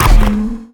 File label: chimera take hit; mutants; STALKER; customnpcs